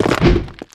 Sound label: preattack; tiny